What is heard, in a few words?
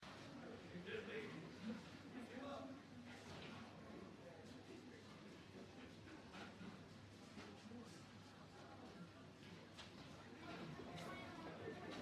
office ambience; livekit agents